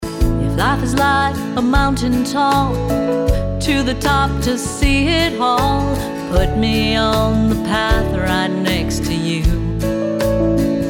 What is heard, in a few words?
SONG